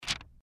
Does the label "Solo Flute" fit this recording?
No